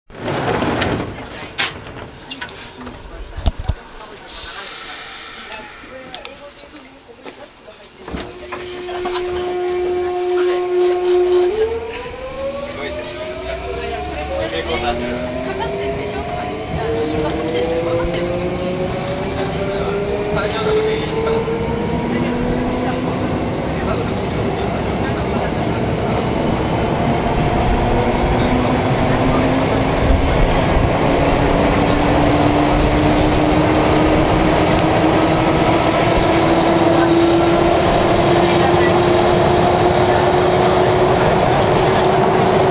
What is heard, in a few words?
sotetsu 5000, train motor sound